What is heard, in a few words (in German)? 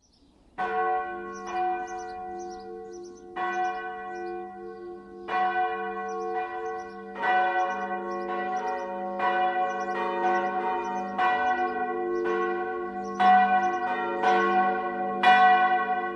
Breitenhill, glocken